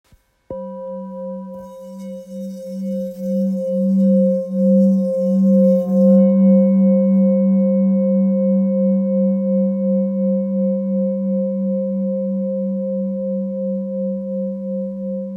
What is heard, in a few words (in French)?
Bol ancien sol